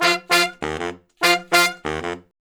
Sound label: Horn Section; Traditional; Sonic Foundry (Sony Creative Software); Jazz Solos and Sections